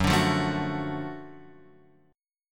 F sharp Minor Major 7th Sharp 5th F sharp down Guitar Open F; strummed